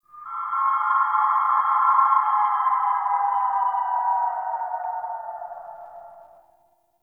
Exterior Space Whistles; Ambience; Warframe; Railjack

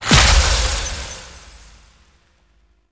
Bleeding Shards Impact; Citrine

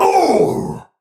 Pain Heavy; Tau; Dax; Battle Barks

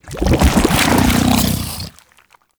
Unburrow Goo; Warframe; Hunting Critters; Undazoa

